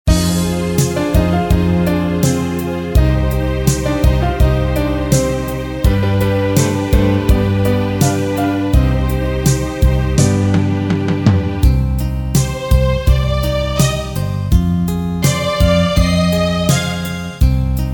Enka, Casio CTK-601

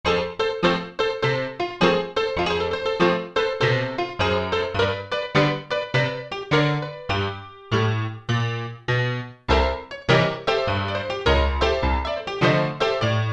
Saloony, Alesis ZA Stereo Jazz Piano

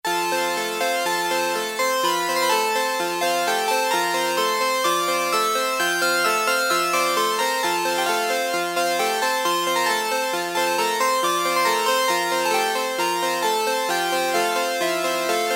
Harpsichord, Roland Juno-106; Factory Preset

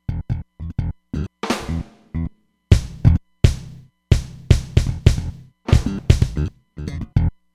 Smpl Trig, Drums

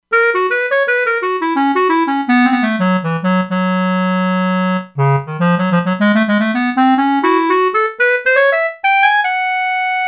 Clarinet-A, E-mu Proteus-2; Factory Presets

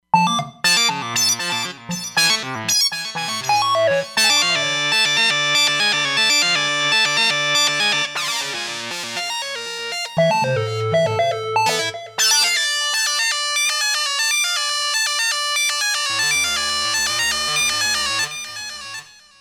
Tekno Tone, Roland Fantom XR

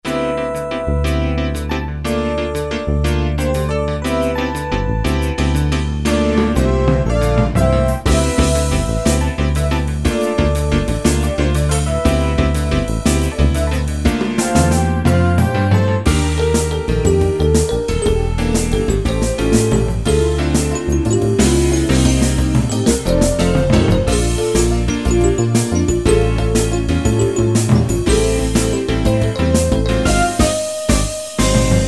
Keyboard, Generalmusic pRP8; Factory demos

